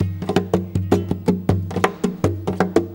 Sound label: GUIT M KNOCK; Best Service; World Colours